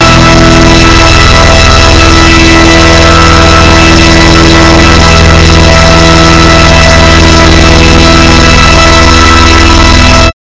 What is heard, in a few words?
loudest E note; pranks